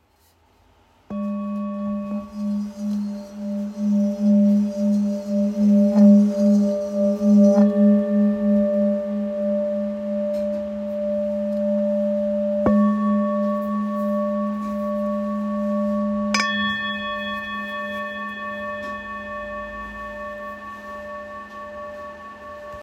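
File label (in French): Ancien sol dièse 865g, Bol Chantant Tibétain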